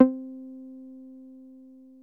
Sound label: 303-Style single hits